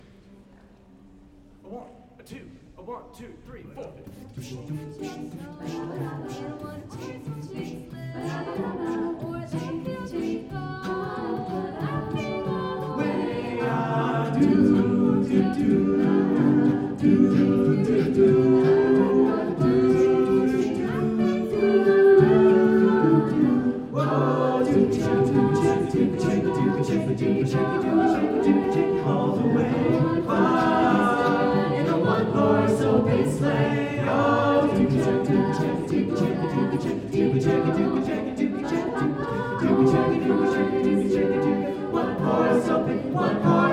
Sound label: jingle bells